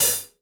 Gretsch Hi-Hat Closed

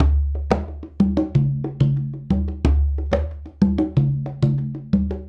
Congas; Essential Percussion

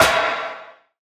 Clocks, Industrial Hits; Antidote Advent

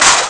short crash; ST-XX Amiga Soundtracker Sample Packs

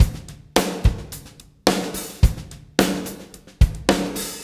PULL, Backbeat, PULL UP; Spectrasonics

